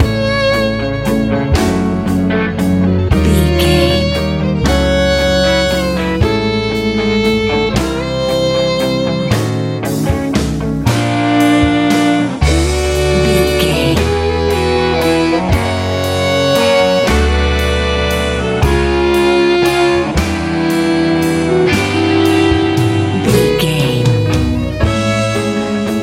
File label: sunny big good airplane ride dreams easy pop